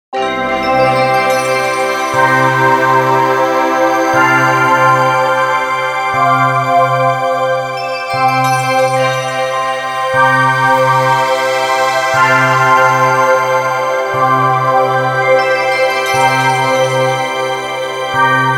Bed Chimes Bells Synth Euphoria; Production Element Imaging Element Accent Transition; 5.1 STEREO MIX; Blastwave

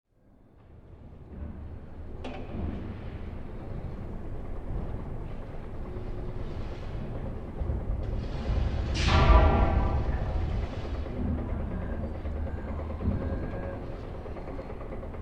Busy Building site Activity With Traffic; Construction; Tom Hutchings

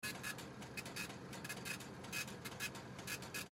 Atm Machine Print Receipt; Technology; Miscellaneous Electronic; Blastwave

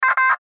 Radio Morse Code; Weak Signal Letter A; Warfare; Blastwave

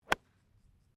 GOLF SWING IRON TOP; Various Golf sounds; Golf Sound Effects; Golf (Sound Effects:Sports)

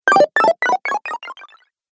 Retro Videogame Fx Replenish

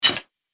Robotic Menu Click

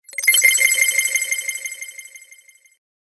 Scifi Sorcery, magic sound effect; Science Fiction Sfx Noises; Andrew Fleming

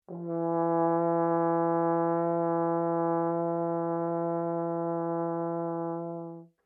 HORN E4 MF LONG, French Horn in F; Alexander French Horn in F; Musical

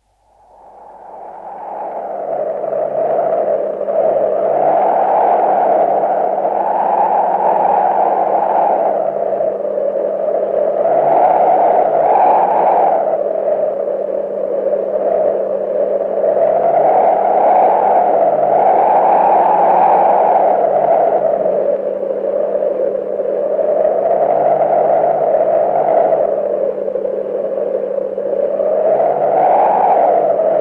High-pitched wind; Weather; BBC

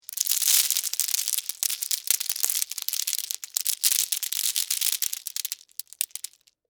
Debris Lima Beans; Texture; Spill; Materials; BlastWave

